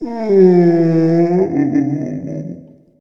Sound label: noise moan; Haunter